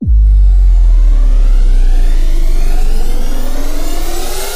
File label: Riser Low End